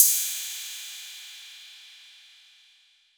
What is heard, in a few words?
PERC 808